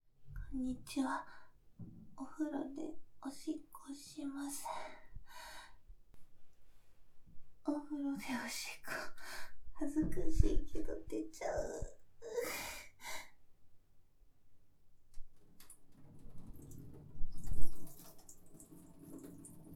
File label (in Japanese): お風呂で オシッコですッ